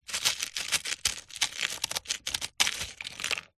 industrial screws nails in plastic tool box tray rummage